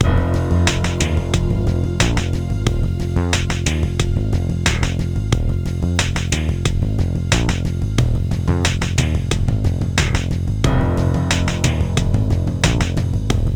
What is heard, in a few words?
Battle